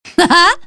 wind laugh; Dota 2; windrunner

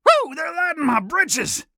Warrior Taking Damage; Realm Royale; Hi-Tek Trooper

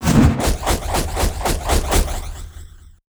attack; Moon Glaives

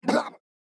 death

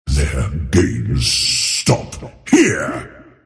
attack pain; earthshaker; arcana

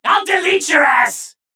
Taking Damage; Paladins; BombKing

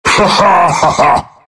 ursa laugh

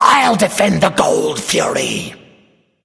Laughing Skull Self Defend; Bakasura

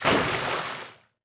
dive; feheroes

